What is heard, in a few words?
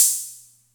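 Atlantex Music Percussion Computer hi-hat open; Atlantex MPC-1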